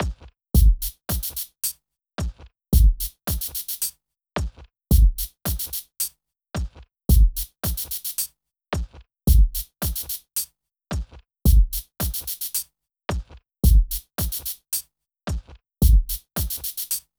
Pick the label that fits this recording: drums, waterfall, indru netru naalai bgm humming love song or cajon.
drums